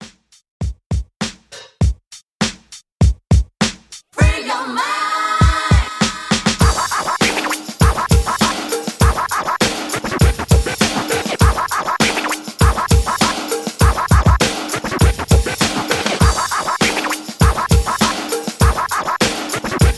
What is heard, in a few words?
90s Scratch, 100Bpm; Redrum